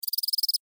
Insect Voice Chirp, Vocalization, Bug; Animal

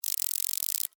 Extermination, Bug, Zapper, Kill, Insect; Sound Effects:Animals:Insects; Blastwave

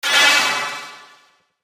Laser Shatter; Laser Sound Sound Effects

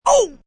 Pain Short Ooo, Pain, male, short; VoiceBox

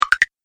Magic Tokens Game Fx; Video Game Sound Effects

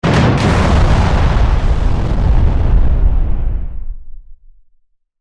Science Fiction Explosion Rumble Crackles; Battle

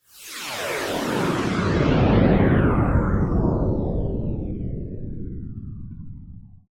Whoosh Laser Descend; Whoosh, High, Fast, Flange, Production Element, Imaging Element, Accent, Transition; BlastWave

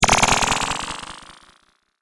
Cartoon Magic Spell

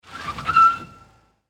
Car Tires Screech; LYN110 package of Power Car sounds; LynneMusic

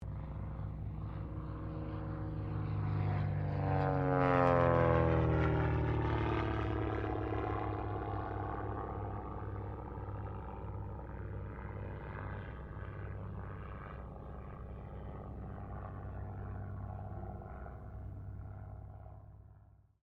AIRCRAFT CESSNA O-1 BIRDDOG PASS

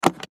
Button Switch; Multimedia Web Interactive

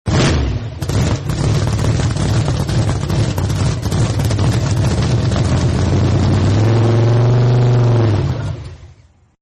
Hot Rod Shut Down, Cars; Sound Effects:Vehicles

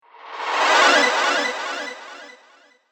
Cinematic Whoosh Flyby

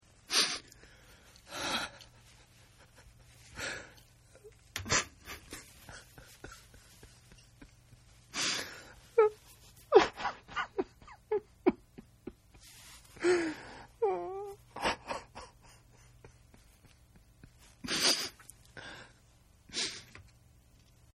Sobbing Male; Crying; Voice

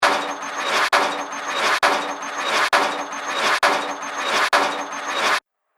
Horror Nasty Machine; Horror - Oh What A Nasty Machine; Evil Sound Effects

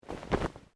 Clothing Shirt Cotton Move

